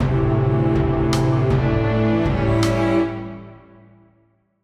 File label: jingle horror sting